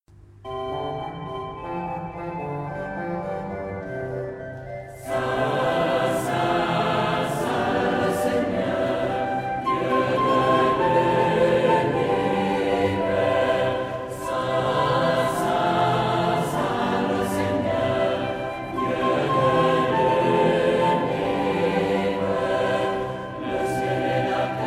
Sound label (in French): rameaux chant